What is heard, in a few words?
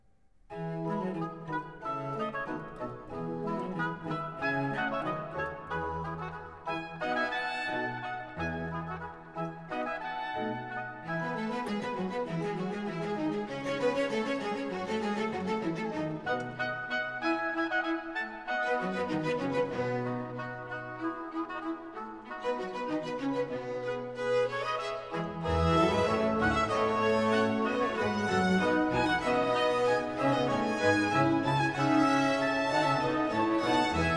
Soprano, Alto, Basso; Magnificat, RV610; Sicut Locutus